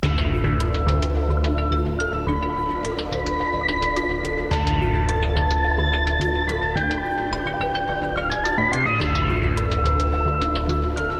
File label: leads